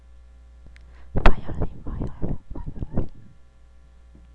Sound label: violin